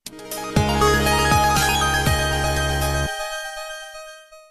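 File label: Galaxy s8 startup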